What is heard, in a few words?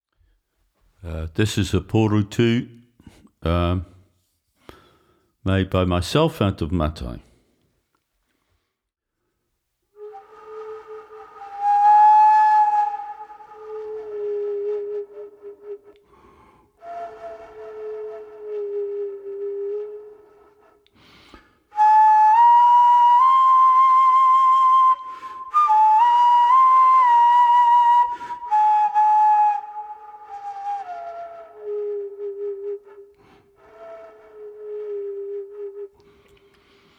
Porutu Nui